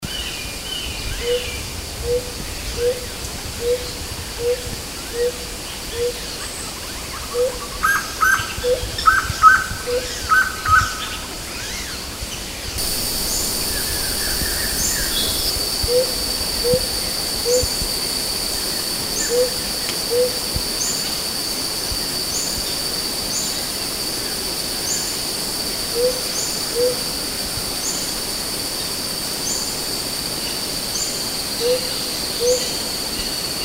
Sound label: bornean laughing-thrush